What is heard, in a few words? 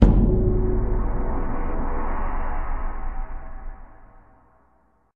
wasteland gong